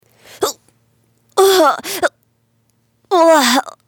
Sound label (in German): wuergen